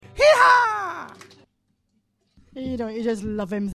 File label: yeeha; ashington folk club